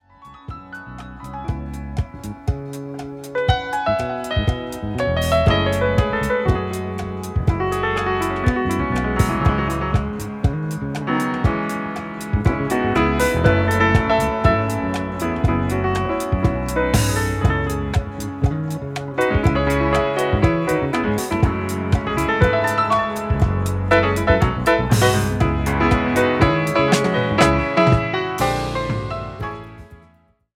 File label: piano